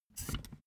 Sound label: office chair hydraulics down stepwise